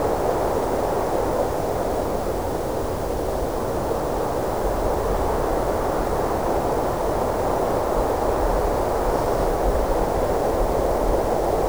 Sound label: Showroom day, Ambience; Project Gotham Racing 2